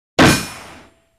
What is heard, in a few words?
Bullet Bounces Off, metal